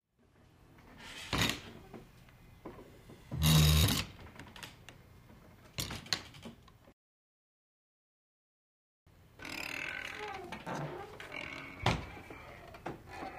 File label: Metal door creak